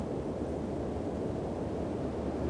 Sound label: WIND